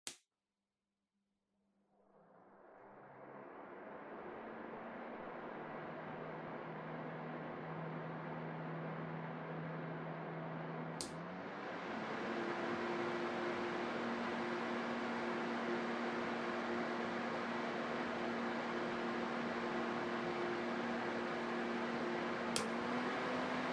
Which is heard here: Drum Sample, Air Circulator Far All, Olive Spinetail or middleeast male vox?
Air Circulator Far All